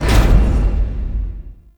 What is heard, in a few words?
UI Round Complete; Warframe; Missions; Zariman; Zariman Assassinate